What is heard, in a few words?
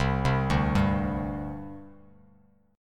C Suspended 2nd, C, down, Bass Standard; Csus2 arpeggiated; JGuitar